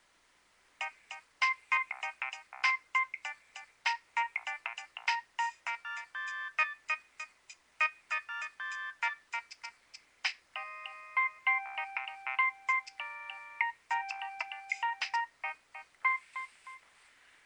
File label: nokia3100 ringtone